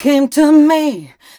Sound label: f mel e flat, fem Vocals; Mutekki Media; Diamond Vocals 2